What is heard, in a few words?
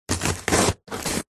foley cardboard box cut stab and remove utility knife; zapsplat